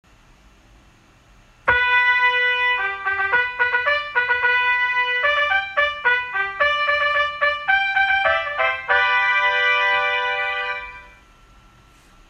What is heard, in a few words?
fanfare